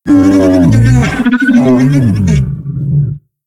moschops cuddle

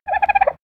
dodo mumble

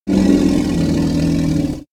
phiomia vox mumble

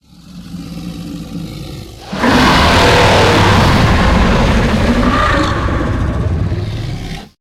King Titan sound

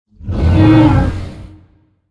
Diplodocus sound

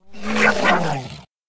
Oviraptor Startled

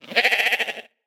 ovis idle

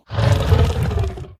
Xiphactinus sound; ARK Additions

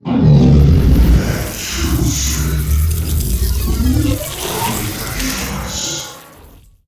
Overseer sound

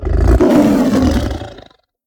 Saber sound

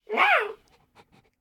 jerboa idle

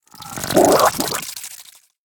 moth idle

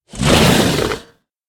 Dimetrodon Taunt

